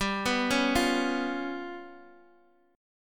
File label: G 7th Flat 5th, Guitar, Open D